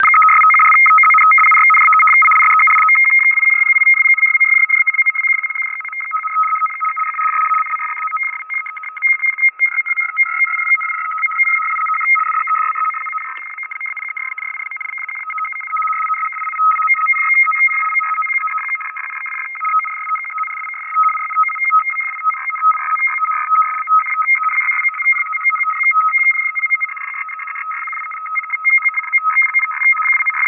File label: RTTY